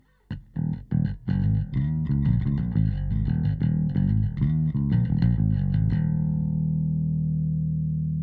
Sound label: Compressor bass Bass Leveler